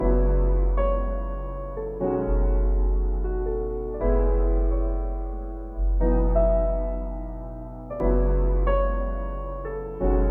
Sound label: short film; Coastal Echoes